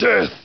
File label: Death; KKND2